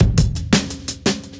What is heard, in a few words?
Beat Style, 170bpm, breaks